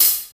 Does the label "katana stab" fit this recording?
No